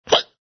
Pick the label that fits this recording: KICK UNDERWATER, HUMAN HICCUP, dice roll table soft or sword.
HUMAN HICCUP